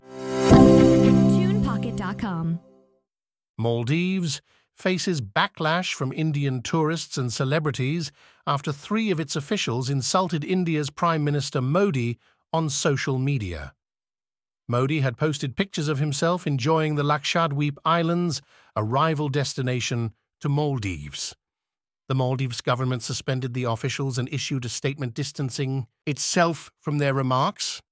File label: corporate logo; tunepocket